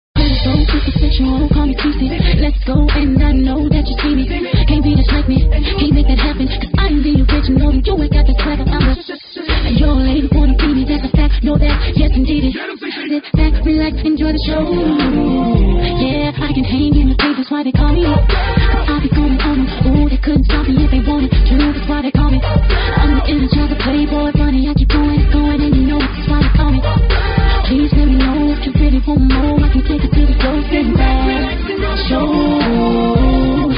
funk; multitrax

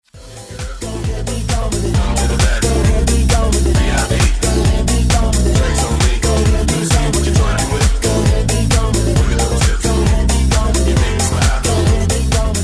funk rnb hits remixed; multitrax

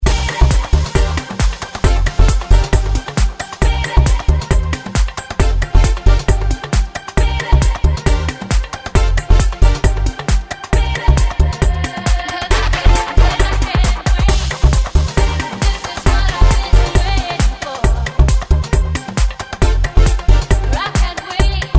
top40; powermusic

